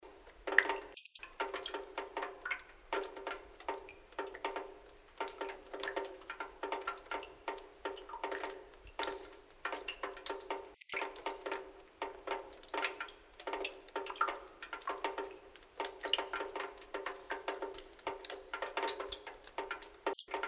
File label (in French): tonneau vide